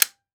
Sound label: Weapon Gun Pistol 9mm Glock Dry Fire; AdobeCC